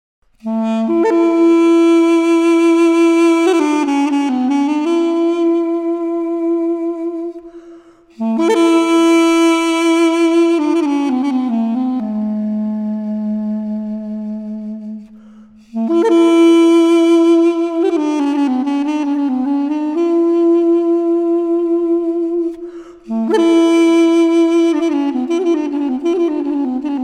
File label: solo duduk